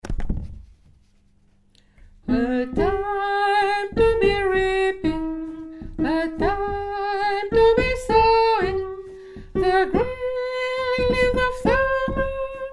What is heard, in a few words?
tenor